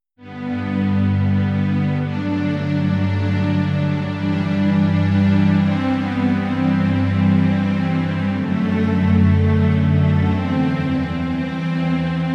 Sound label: Strings Ensemble; VIVO H10